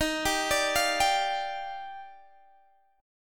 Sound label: Eb Major 9th, Guitar, Open G